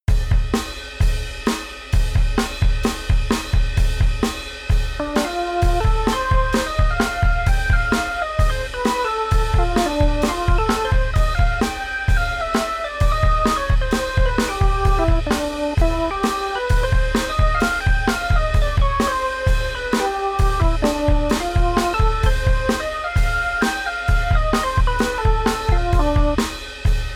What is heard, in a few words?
Sample drum big